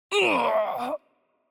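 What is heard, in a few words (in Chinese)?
Hurt Heavy, Chinese; VO; bloom minigame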